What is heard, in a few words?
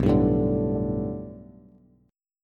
B Minor 7th Sharp 5th B Bass, chord; Bm7#5; strummed; 7,10,7,7; JGuitar